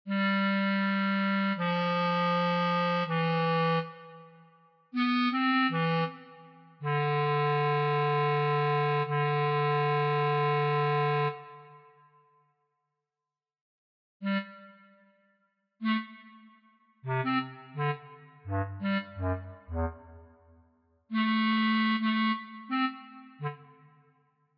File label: Bass Clarinet; 吹奏楽; R6 吹奏楽部 卒部式